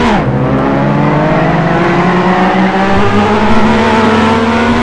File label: 3rd gear, vehicles; enzo